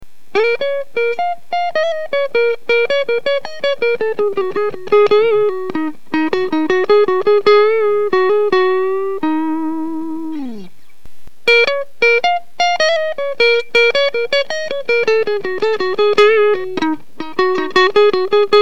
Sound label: Strat new brightness